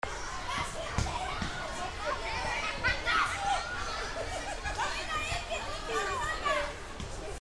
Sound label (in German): Schulhofalter, Children, Schoolyard; Reisholz/Düsseldorf; Christoph Collenberg